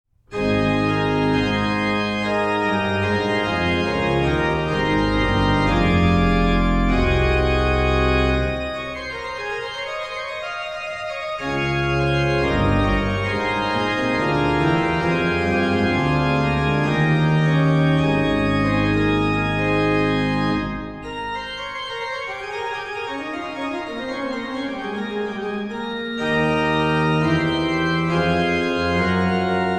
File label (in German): Herr Jesu Christ dich zu uns wend, Bach Organ Works Chorale Preludes; BWV 726; Individual Chorale Preludes